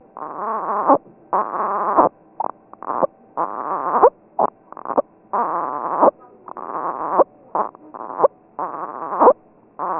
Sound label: grunting